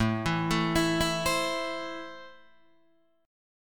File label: A Minor, Guitar, Open D